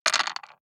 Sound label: DICE on Wooden Tray, Throw and Roll, Standard, 6 Six Dice; Samples; ArticulatedSounds; DICE Sfx Party (Extended)